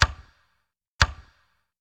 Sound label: Tick Tock; cstrike